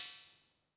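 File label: Hi-Hat Open, Luxor Passet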